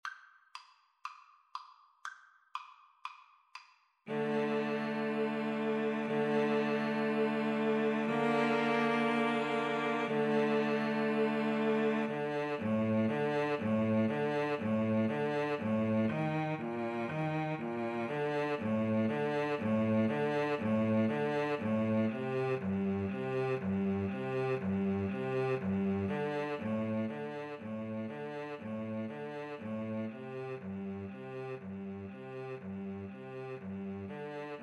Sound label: Viola Cello Duet